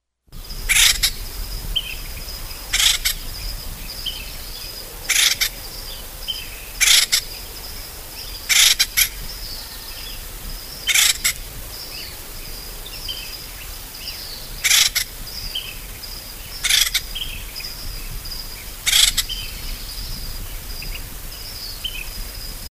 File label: Acorn Woodpecker